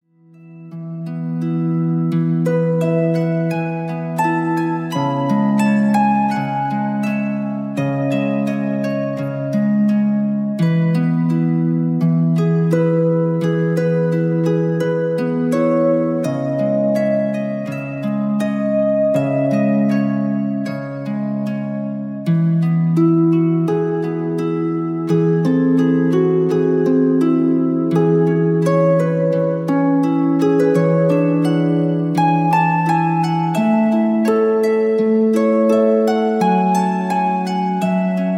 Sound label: Dreaming Harp